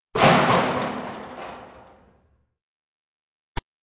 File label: Track, Doors; 헐리웃특수효과음; 문 소리